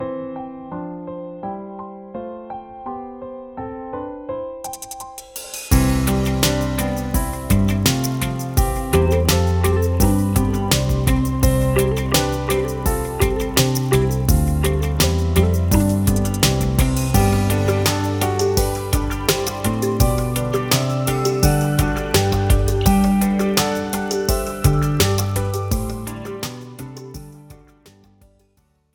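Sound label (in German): Tonleitern, Flötentöne; Meine ersten Floetentoene 2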